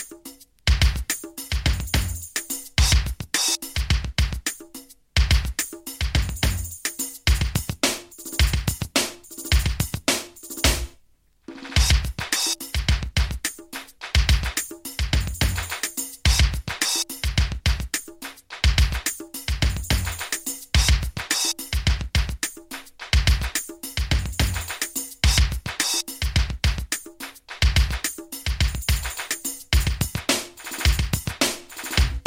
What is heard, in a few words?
beat